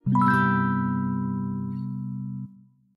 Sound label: Chord